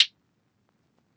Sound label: Hihat; Cymatics; Lofi Starter Pack; Hihats - Closed; Tooth Hihat